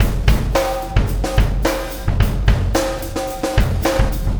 Wormhole Beat, Processed Drumkits

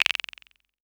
Electro Percussion, House; Drums

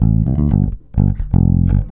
REAL BASS, BASSLICKS; Ueberschall; Jam Box

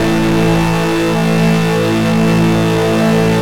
Organ, Drum & Bass Keys

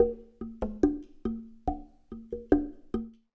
SLIT DRM; Spectrasonics; Liquid Grooves 1 & 2; TYRANT

